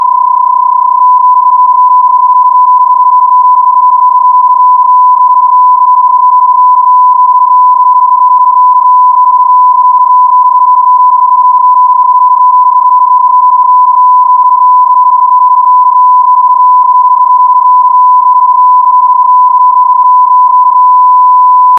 cq psk31

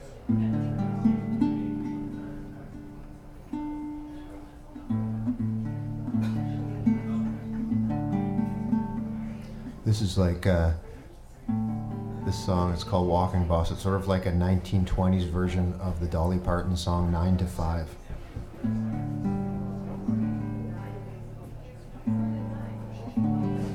gourd tuning; walking boss intro

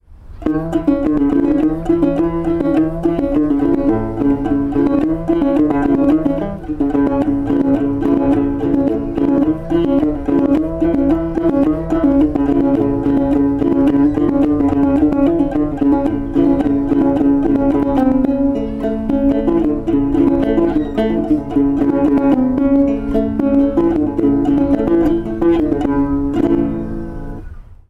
Train Gourd; Reubens Train

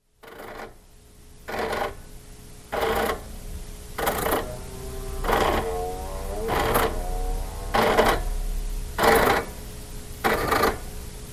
hp7470a plotter

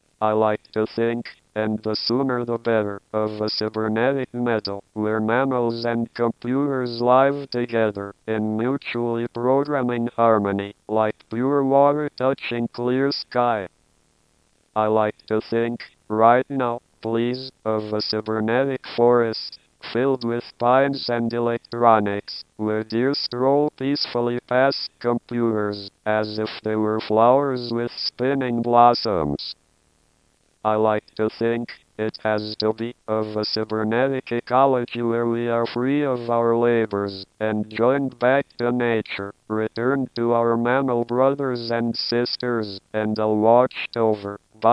140wpm

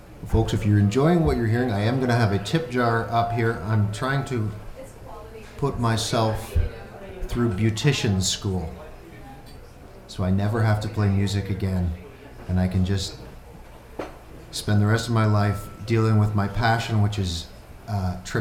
cold guitar tuning

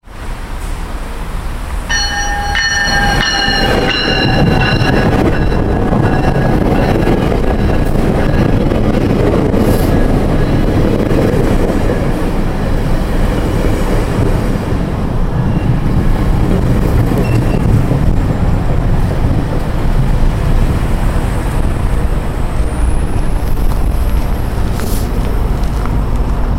train now arriving

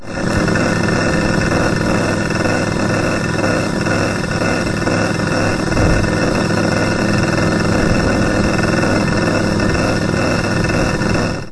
gasmeter; walmer

